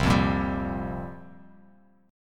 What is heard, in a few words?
C 7th Suspended 4th, Bass Standard; C7sus4 strummed